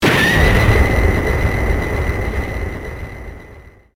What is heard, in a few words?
ping